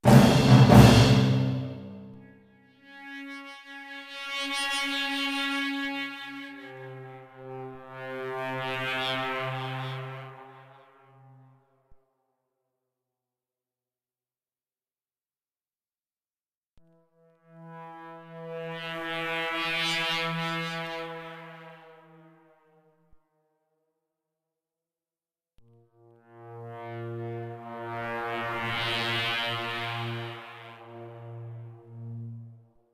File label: chopper hit; Blacksite Area 51